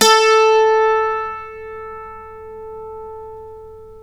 a3, Mandolin; Guitar; Terkelsen

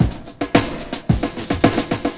Basic w fill, beats; Sonic Foundry (Sony Creative Software); Ugly Remnants VOL-2

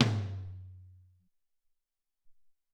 Amb Tom M, Alesis SR-18

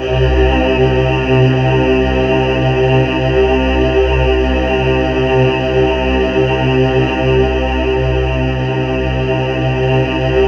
C2, Synth Leads

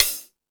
House Machine, Drum Machines

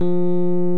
Electric Pianos

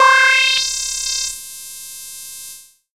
syn c, Dance Synths; ProSamples vol.37

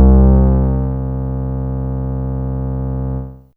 M Clav C2, Analog; Fairlight CMI IIe

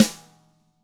DW HIT FD, Double Platinum Drums; ILIO

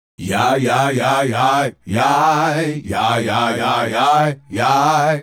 Male Group, Hip-Hop and RnB Vocals

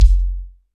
BD, BD REAL

